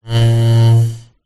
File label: household chair slide wood floor rub squeak